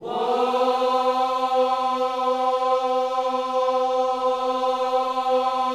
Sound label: WHOA C 4, Vocal Planet Gospel; Spectrasonics; Vocal Planet Vol.1 Gospel; akai